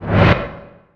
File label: tail thump cast; weapons; dota; pangolier